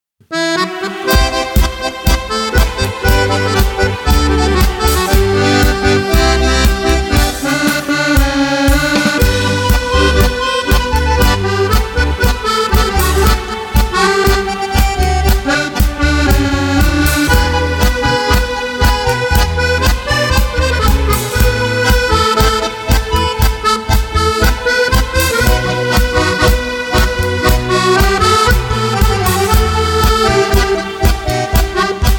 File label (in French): tango